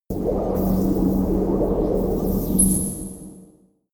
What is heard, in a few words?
alien cosmic birds machines high; aliens